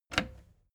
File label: plastic button slack push